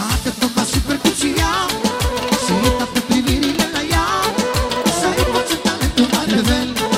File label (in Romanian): bate toba si percutia